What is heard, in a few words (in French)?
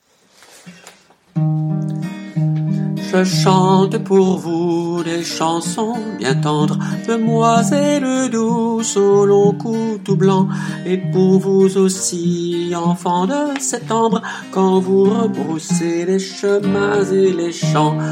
Je chante hommes, chorale